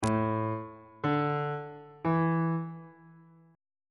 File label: a suspended 4th triad chord intervals